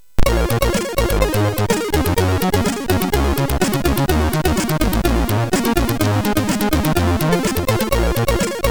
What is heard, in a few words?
DISCO, MOS6581; MOS6581R3